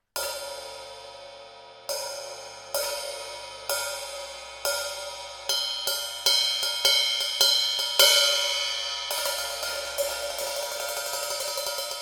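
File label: hi hat BYZANCE MED BRIL 14, CYMBALES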